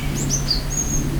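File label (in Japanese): シジュウカラ, 【動いてもいいよ】 この鳴き声で、じっとしていたヒナ達は、何事もなかったように動き出す。; Parus minor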